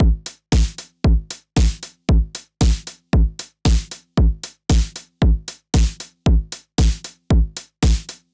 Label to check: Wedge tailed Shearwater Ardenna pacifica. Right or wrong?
Wrong